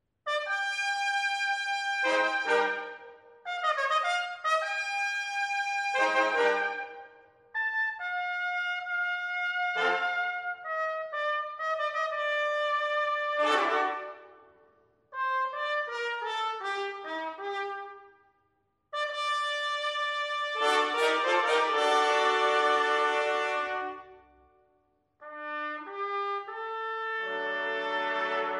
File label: Flamenco